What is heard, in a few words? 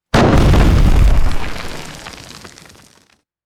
medium explosion blast with falling debris; explosions